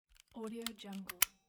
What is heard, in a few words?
electronic device battery cover close